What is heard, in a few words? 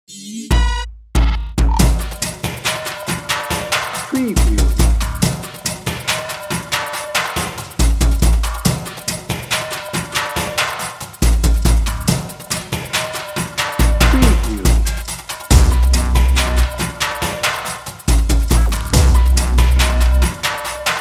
brain noize no vocals